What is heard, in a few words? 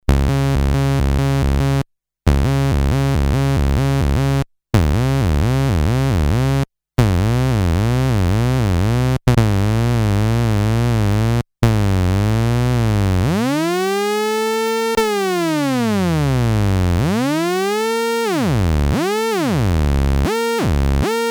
portamento, korg ms20 synth